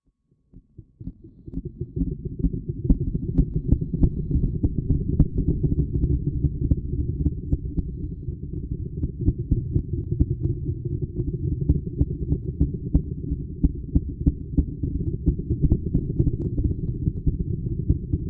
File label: Underground Machine, low freq modulation